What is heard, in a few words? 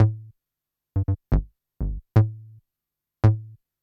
SNTH BASS HOUSE 125 A; eJay